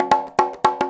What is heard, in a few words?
FILL L, Percussion; InVision Interactive; Percussion Slam